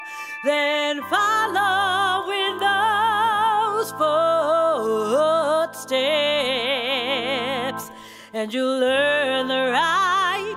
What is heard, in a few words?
Vocal; Nunsense